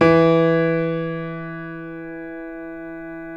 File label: PNO, KEYS; Synclavier Sampler Library